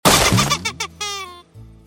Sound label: gambler lose; Fruit Fiesta; Wazdan